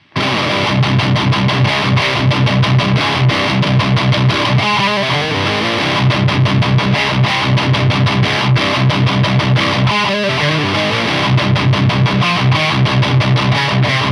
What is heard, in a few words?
Soldano 2x12 SM57 metal; Cab test